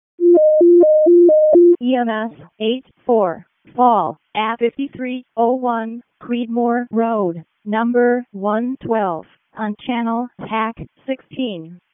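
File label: EMS ALERT, radio; wake; p25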